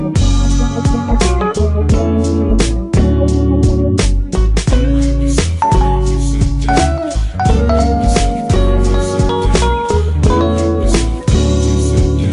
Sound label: RINGTONE